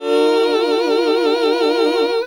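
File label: Gospel; Best Service Gigapack 1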